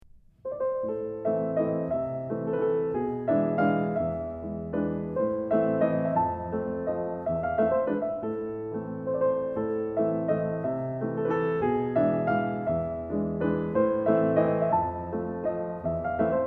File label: German Dance in A Minor; Magrath Book 08